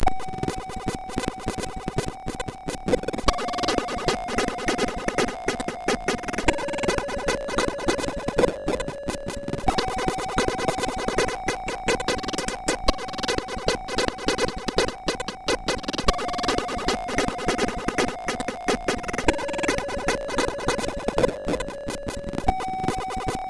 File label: sid, MOS6581R4